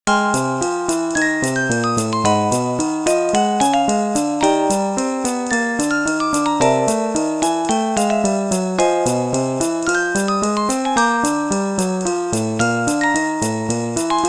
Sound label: Urban Style